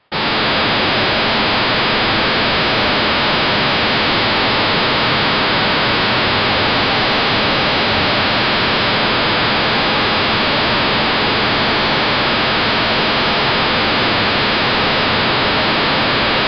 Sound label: zen white noise mix